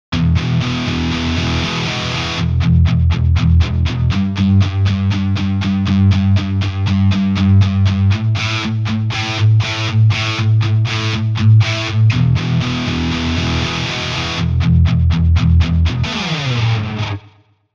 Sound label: heavy Voicing Fifth; Les-Paul-Modell; Session Guitarist Electric Sunburst